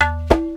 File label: TABLA L